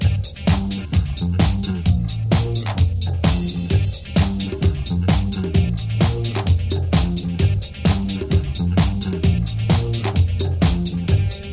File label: House